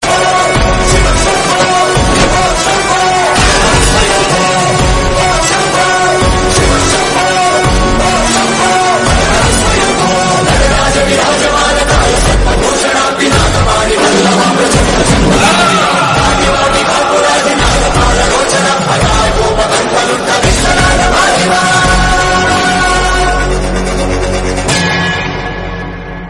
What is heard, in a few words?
Telugu Ringtone; Akhanda